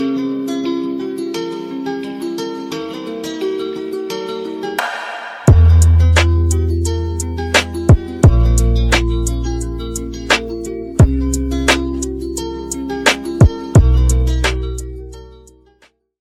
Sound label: Instrumental